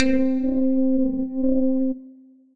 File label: mallet synth; Ableton; Factory Packs; Chop and Swing